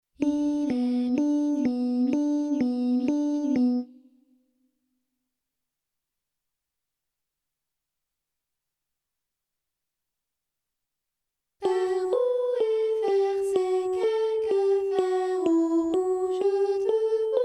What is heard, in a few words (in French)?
soprano solo; minuit